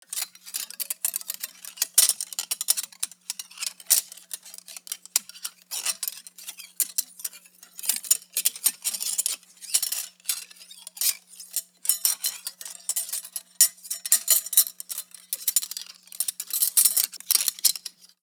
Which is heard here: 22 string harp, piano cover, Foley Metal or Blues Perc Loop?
Foley Metal